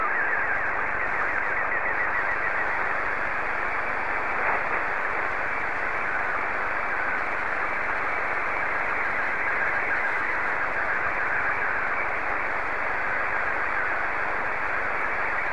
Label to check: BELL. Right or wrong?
Wrong